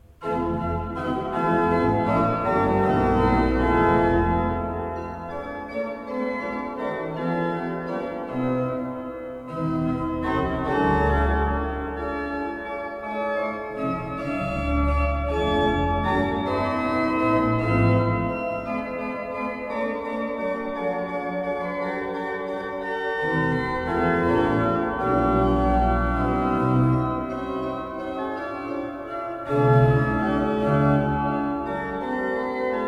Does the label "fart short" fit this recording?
No